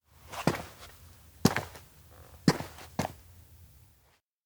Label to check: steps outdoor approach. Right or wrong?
Right